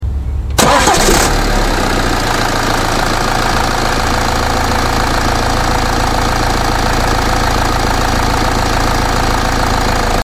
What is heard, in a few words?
x3 20d Ignition Outside